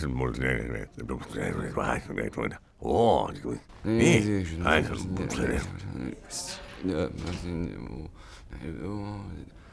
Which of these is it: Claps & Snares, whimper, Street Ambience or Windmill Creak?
whimper